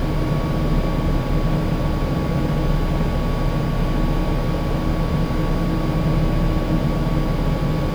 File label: ambience industrial